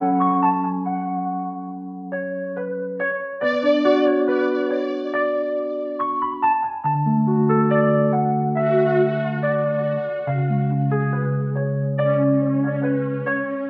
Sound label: keys, Loops, Sad